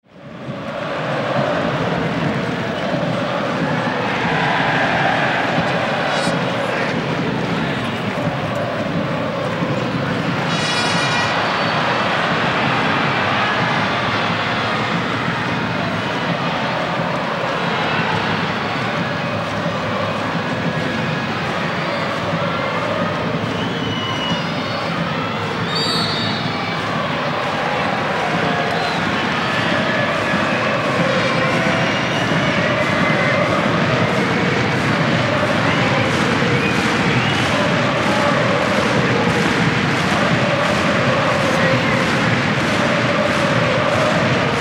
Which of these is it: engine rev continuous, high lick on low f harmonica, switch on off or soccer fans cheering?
soccer fans cheering